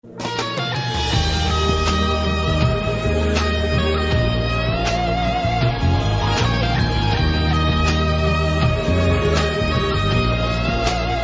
Sad Guit

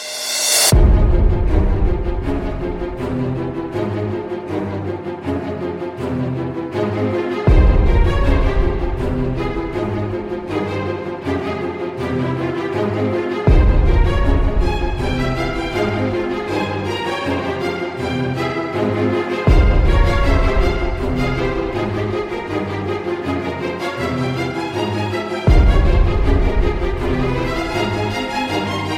Running Strings

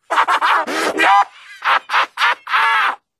Demon Laugh; Cicero

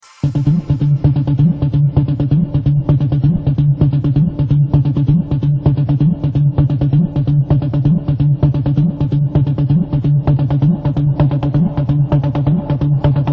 Trance Mix

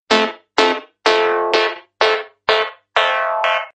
Electronic Sms

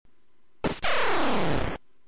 Death Sound; Contra

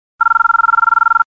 Nokia 8110; Matrix

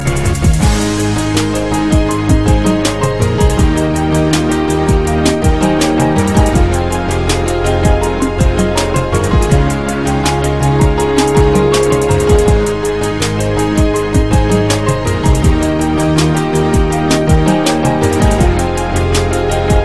Karaoke

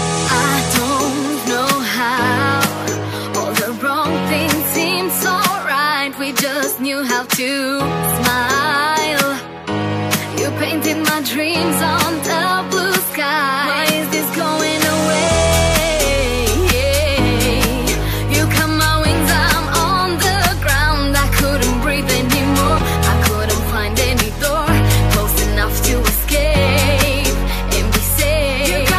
Female Dubstep Voice

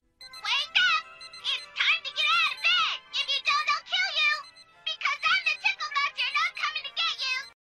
tickle monster

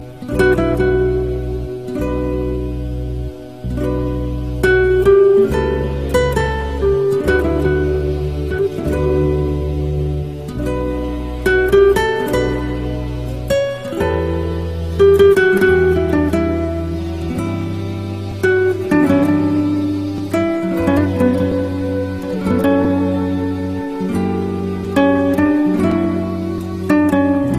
New Romantic Guitar

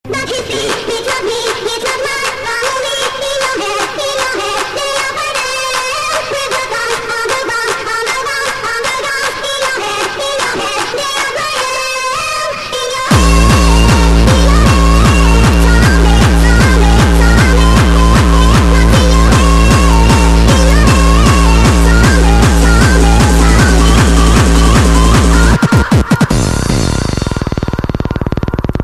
Zombie

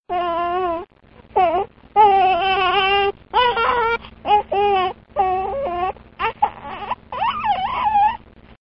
Baby Laugh

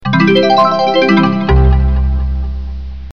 Harp

